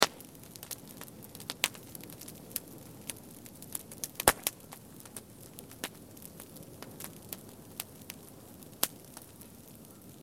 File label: fire